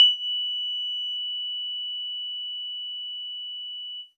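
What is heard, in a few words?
EAcoustic DX100 F#6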